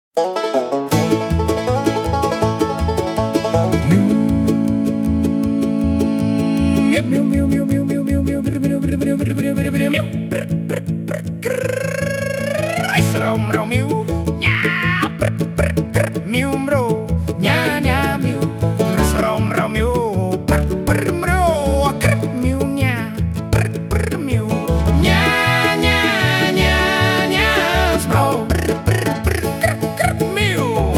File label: bluegrass